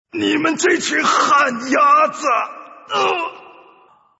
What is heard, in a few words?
fish die